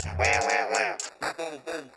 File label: wah wah wah